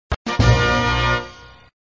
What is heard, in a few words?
Music, flipper